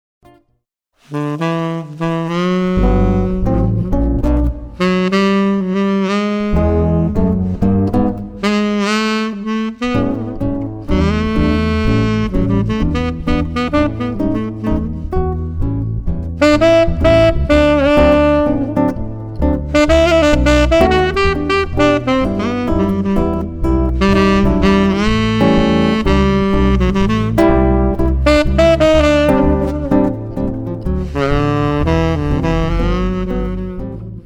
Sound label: Jazz Trio of G; guitar base jazz; Lyrica Demo